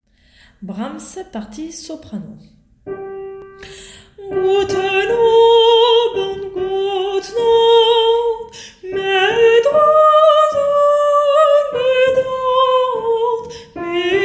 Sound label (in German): Wiegenlied partie soprano